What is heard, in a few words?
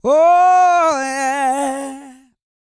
GOSPEL